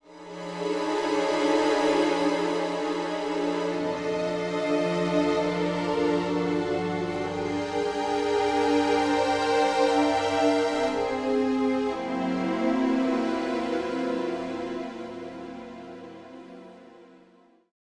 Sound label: 4 chords horror dissonance